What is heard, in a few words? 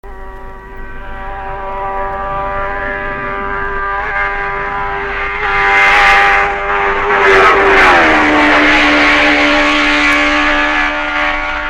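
Gillera, motorcycle; 1963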